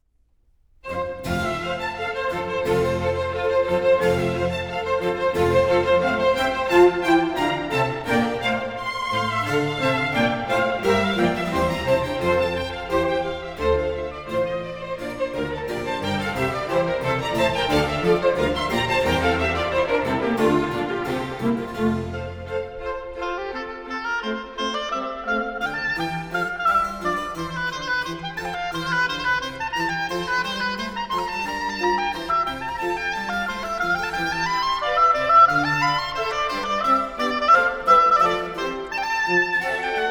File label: Oboe Concerto in F Major; J.S. Bach